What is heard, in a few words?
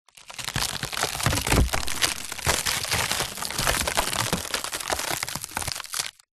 impacts wood collapse break splinter